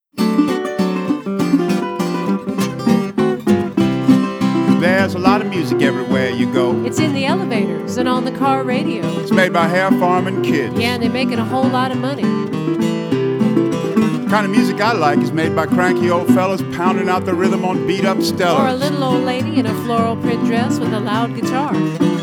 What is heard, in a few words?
Guitar Rag